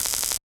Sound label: Taser Hit; Weapons